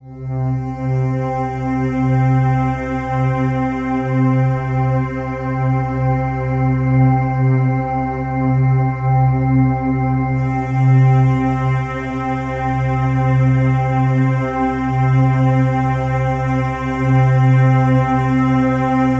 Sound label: Atmosphere C; Ghosthack; Free Male Vocals and Atmospheres